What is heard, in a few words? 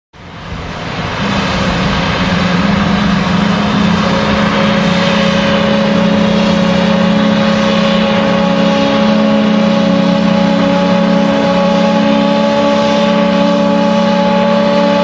horn